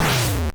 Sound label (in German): verletzt; Ninja Gaiden; gamesoundopedia